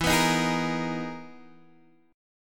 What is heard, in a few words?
E Major Sharp 11th, Guitar, Standard; EM#11 strummed; x,7,9,8,9,6; JGuitar